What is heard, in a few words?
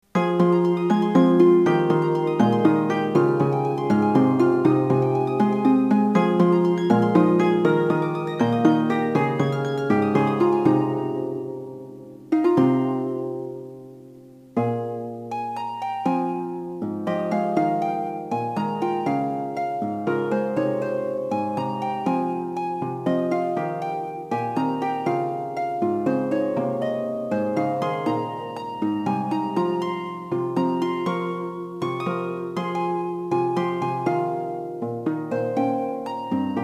Undine Lyra